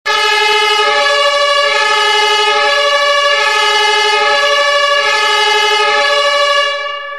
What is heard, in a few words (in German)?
feuerwehralarm